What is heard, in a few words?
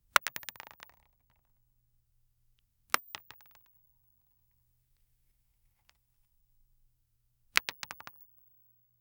close up sound of a profe, bow